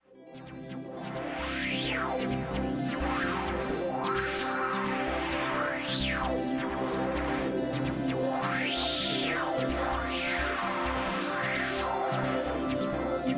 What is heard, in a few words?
Club Theme